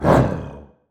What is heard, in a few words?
Canine Regular Roar; Monster Sounds; TheDeclineOfWarriors; Monster Sounds Volume II